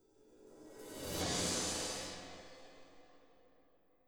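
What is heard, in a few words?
Reverse Cymbal; Legend of Keepers (gamerip) (2019)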